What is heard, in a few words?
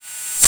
Rev Ride; Quasimidi Rave-o-lution 309